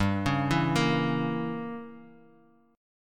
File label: G Diminished 7th G, Guitar Open D; 5,4,2,4,x,x; down